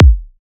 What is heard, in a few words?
Kick E, Psy Kick; Drums; Ghosthack; Ghosthack Advent Calendar 2017 Day 9 - Psy Kicks; Ghosthack 20 Free Psy Kick